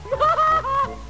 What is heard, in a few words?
Laugh